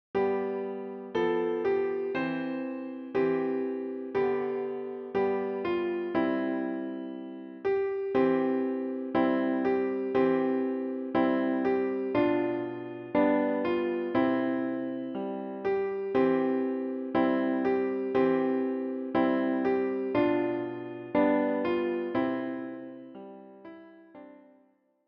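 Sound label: piano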